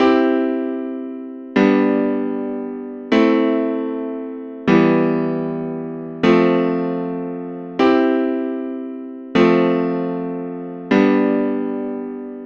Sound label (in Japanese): I→V→VIm→IIIm→IV→I→IV→V進行